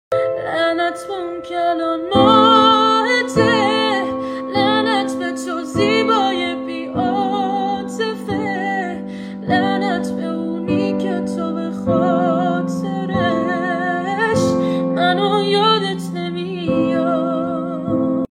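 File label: Gitar, guitar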